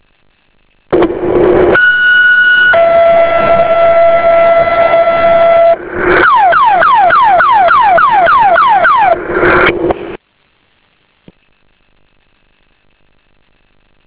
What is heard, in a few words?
Emergency Tone